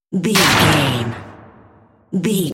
cinematic stab hit trailer

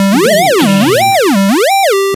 retro synth wobble

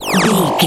retro shield on deflect

